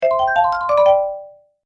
Happy Game Bonus; Video Game Sound Effects

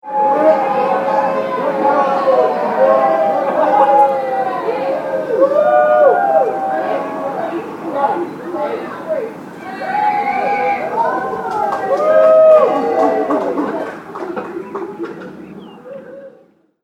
Birthday Party Outside Ambience

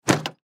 Fire Truck Cargo, Fire Truck Cargo Door Close; Emergency; Emergency Vehicles

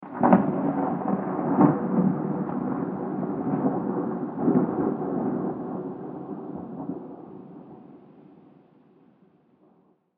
Real Thunder Bad Weather; Outside Ambience; Thunder Sound Effects

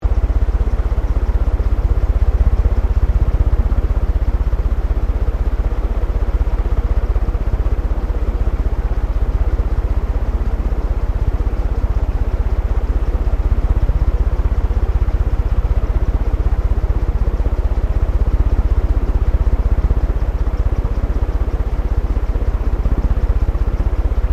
FAN CEILING FAST BESIDE LOOP; Various fans; Fans (Sound Effects:Electronics)

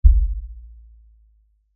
LFE Ultra LFE Hit, Lfe Ultra Low Bass Hit Impact; Deep Tone; Blastwave

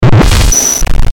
Glitch Blip Harsh Static Warp; glitches; Blastwave

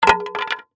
Wood Plank Drop, 2X4; Sound Effects:Materials; BlastWave

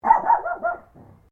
Animal Dog Chihuahua Bark Quad; Newcastle; Various domestic dogs; Dog Sound Effects; Dogs (Sound Effects:Animals); Foundation1

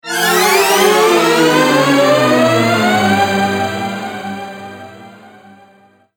Dramatic Power Flashback Whoosh; Whoosh Sound Effects

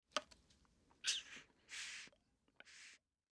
Pipe Put In Mouth Smoke Puff; Smoking; Human; Blastwave; Foley

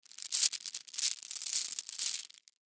Marbles Bag Handle; Rustling Sound Effects; Objects; Foley

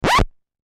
Record Scratch Short; Musical Record Needle Set Down; DJ (Sound Effects:Musical)

